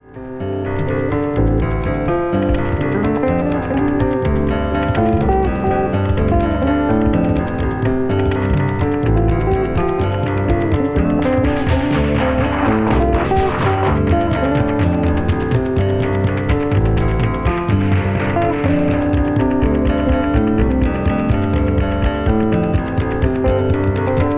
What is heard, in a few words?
instrumental